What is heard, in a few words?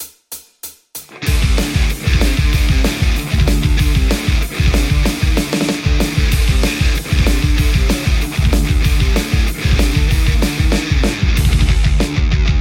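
metal Stratocaster; igniteamps emissary v1 00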